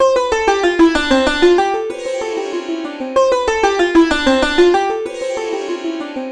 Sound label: sitar sa re